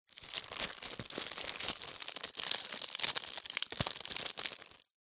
unopened greetings card in cellophane wrapper handling